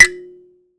Finger, Prepared Kit; Battery 3 Sample Kits

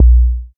BD, Synth; Sound & Vision - Gigapack II CD 2

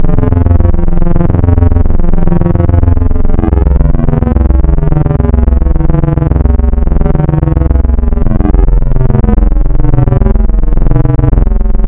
Spectral, wavetables; Architecture 32

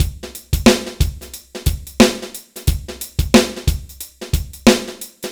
BPM 90 AMB

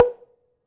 Prepared Drums

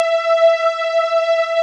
STRG PD E5, Analog Fantasies, KORG; USB Soundscan vol.49; AKAI

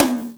LIVHITOM, MPC-60 DRUM2; Greytsounds VOL-1

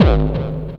KICK hardcore, Techno Trance DRUMS

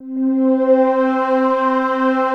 APPROACH, PADS; Masterbits; Vintage Voltage

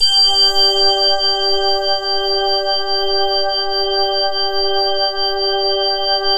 G5 C-sound, choir; NorthStar - The Wizard VOL-2; C-SND CHOIR

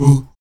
VOX AHOO, Afro Chants, Stacc Ah Oos

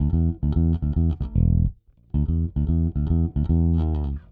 Rock, Bass; Bass X

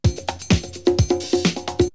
Drumloop; ZERO G - Datafile DISC 1

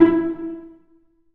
Cel Pz E1; Digital Sound Factory Virtuoso

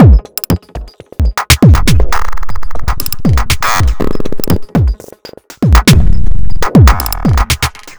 Growl Beat, Drum Loop; Sonic Foundry; The Electronic Music Manuscript Collection; Drum Loop Structures

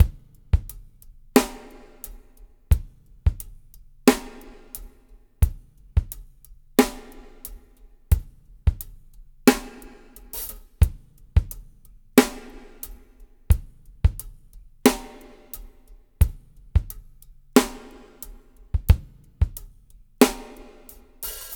LOOSE DRMS; Spectrasonics; Liquid Grooves 1 & 2

